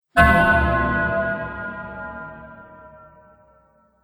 Mangled Metal Impact

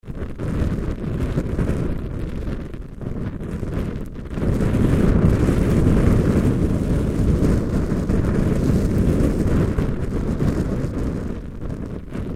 Fire Torch Burn in Wind Strong Light; War; BigRoomSound

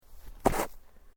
Footsteps Boots Snow Shallow Jump; Footstep Foley; Human

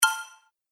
Musical Game Elements, Video Game

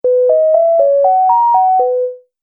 Multimedia Music; Miscellaneous Musical Sound Effects

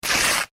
paper rip; Sheet Of Paper Ripping / Tearing; foley; Objects; McKinney Sound

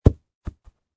Sports Soccer Football Ball Drop On Grass

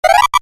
Computer Beep, Short; Multimedia; Stuart Duffield

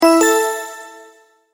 multimedia tone verified

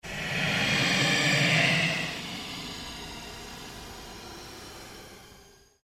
Ambient Pulse Ghost Chatter

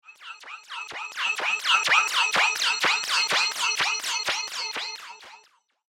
Alien Robot March; Robot Sound Effects; science fiction sound effect; star wars / trek style games

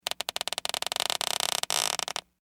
Squeak Creak Stress Slow; Foley; Squeaks

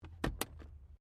CAR MAZDA RX7 SERIES3 EXTERIOR GLOVE BOX CLOSE; 1983; Cars (Sound Effects:Vehicles)

